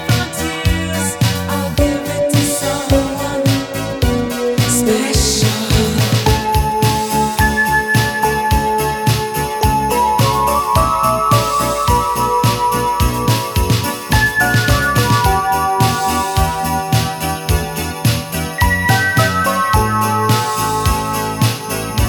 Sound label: Instrumental